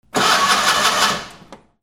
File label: CARS